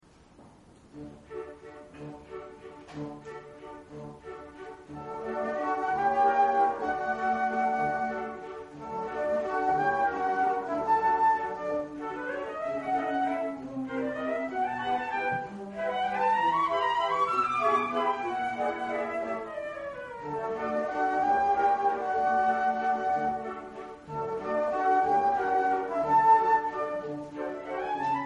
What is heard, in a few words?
concert, flute